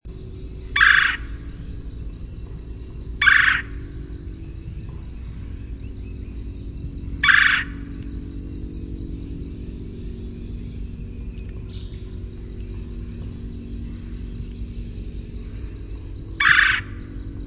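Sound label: kwirr, red-bellied woodpecker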